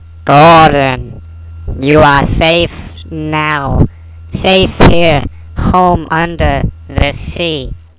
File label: kraken; elena